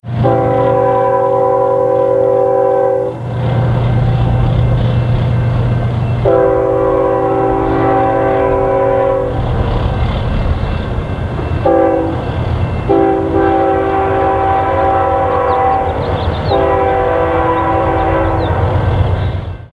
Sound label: horn